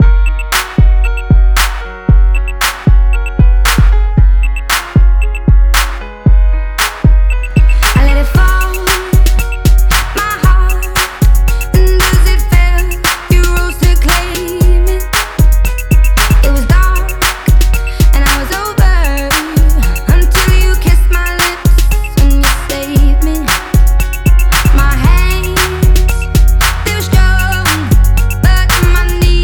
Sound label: Electro Remix